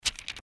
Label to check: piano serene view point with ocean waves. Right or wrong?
Wrong